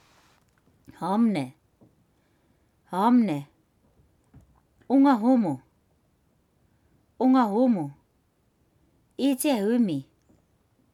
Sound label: hum